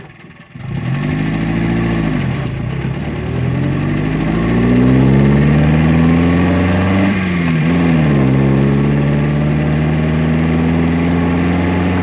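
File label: Car Riley Drive Constant Interior; the 39 steps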